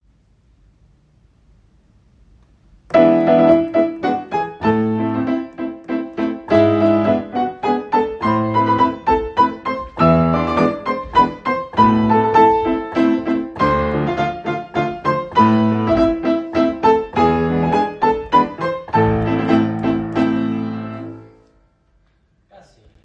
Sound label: Musica Petit Allegro